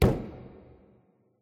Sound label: Vent Walking vent crawl; SFX; PlayerWalk